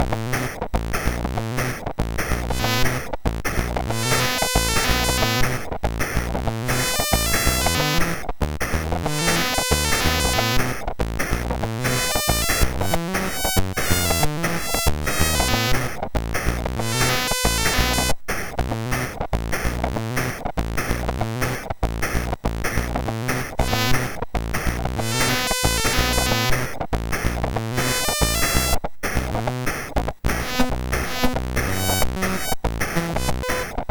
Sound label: Music Demo, MOS6581; sid; MOS6581R4; Anon Music Demo BASIC